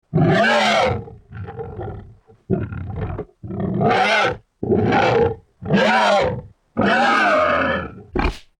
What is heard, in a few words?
Boar; Fleshrender